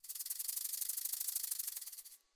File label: spin Start; hiddencoinsofegypt